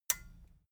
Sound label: metal button stereo release disable